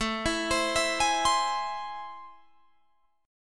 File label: A Minor Major 7th, down, Guitar, Open G